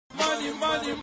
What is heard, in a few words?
money x2